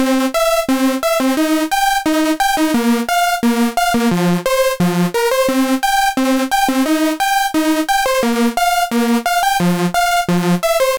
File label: 175BPM Lead